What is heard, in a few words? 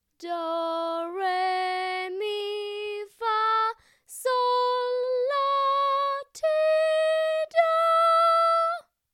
solfa scale; Little Brown Jug